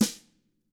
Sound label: DW BR, DW BRSNR; ILIO; Double Platinum Drums 2